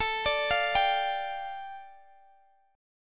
A 7th Suspended 4th Sharp 5th A Guitar Open D, chord